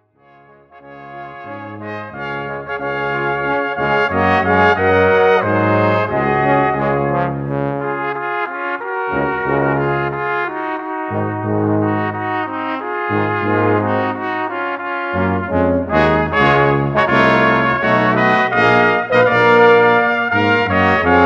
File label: X-Mas Tree Variations, BRASSQUINTETT